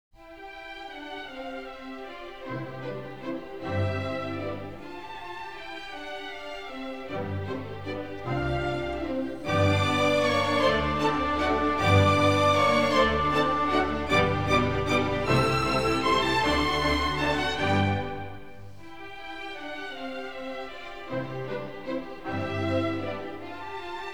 Symphony No. 41 in C Major, K. 551 Jupiter - III. Menuetto. Allegretto; Wolfgang Amadeus Mozart; Mozart - Symphonies Nos. 35, 40 & 41